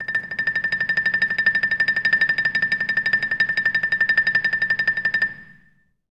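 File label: TREMOLO A3, Orchestra; Best Service; Peter Siedlaczek's Advanced Orchestra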